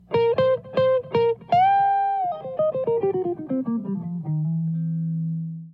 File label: Riff